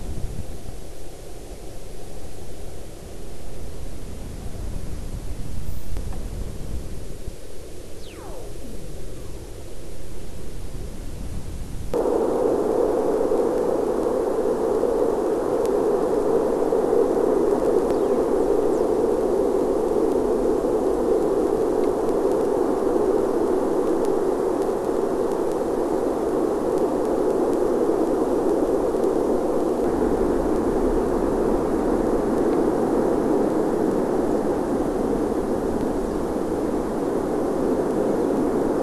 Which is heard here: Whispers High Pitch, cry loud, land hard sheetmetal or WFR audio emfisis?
WFR audio emfisis